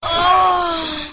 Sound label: "oh" orgasm; Voice